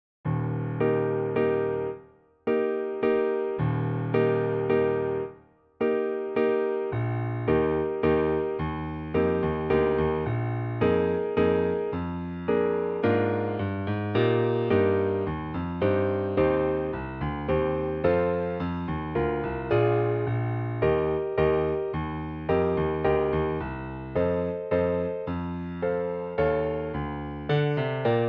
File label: carnival of the animals the elephant piano solo; arranged for piano solo